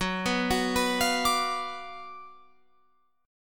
Gb Major 7th Suspended 4th Sharp 5th, Guitar Open F; GbM7sus4#5 arpeggiated